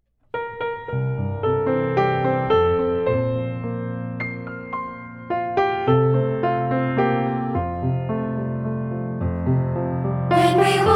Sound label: piano